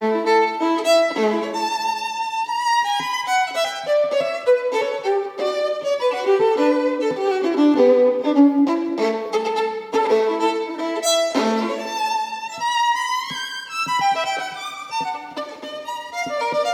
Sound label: Violin Sonata in D Major Moderato; Op 115